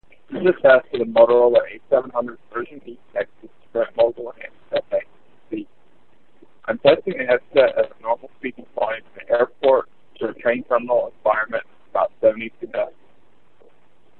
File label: Airport 72db; Moto H700B; Mogul